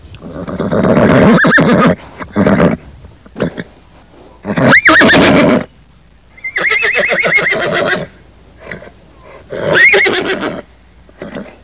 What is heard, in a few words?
HORSE